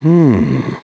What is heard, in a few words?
HUMM; BattleSNDS